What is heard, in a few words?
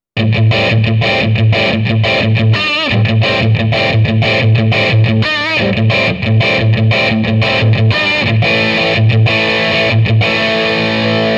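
vintage rock, guitar; Vintage Rock Tone; GD101X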